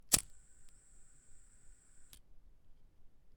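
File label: cheap lighter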